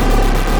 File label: Scifi Synth Drone Loop; SFX Kit; Sci-Fi Loops